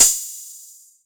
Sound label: TR606 Hat, Roland Tr-606; Drums